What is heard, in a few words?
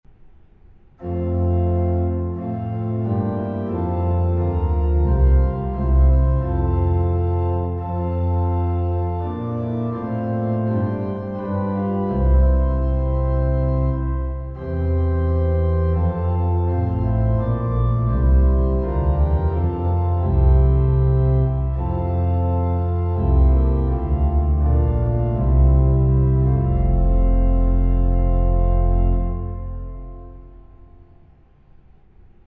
dunfermline, hymns organ